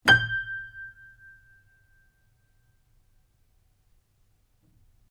PIANO UNTUNED G5 F, Baby grand piano left to fall out of tune; Pianos (Sound Effects:Musical)